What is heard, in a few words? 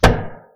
auto Gun BRAP